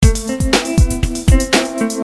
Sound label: depressing rock music